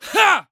Humans Male Grunt Shout; Screams Shouts 2; soundbits

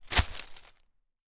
impact rock fall hit ground dry leaves

